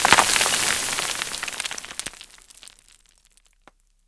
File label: dirt slide; mines